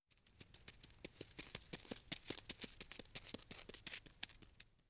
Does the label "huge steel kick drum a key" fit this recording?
No